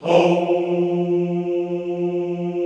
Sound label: HOUUU, Classical Choir; Best Service; Extended Classical Choir